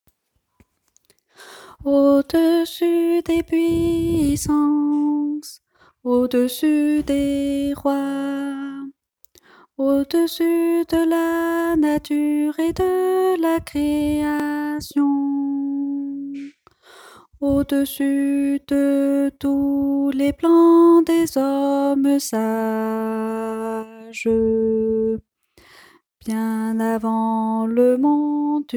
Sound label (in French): alto